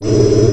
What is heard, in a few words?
Button Reject; PhrozenFlame